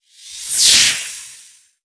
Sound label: tarantula attack; mtarantula